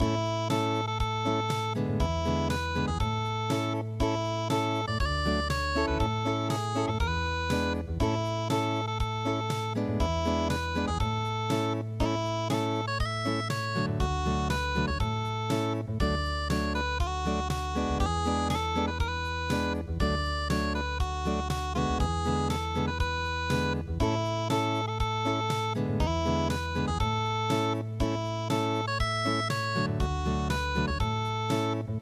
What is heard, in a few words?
unpluged, midi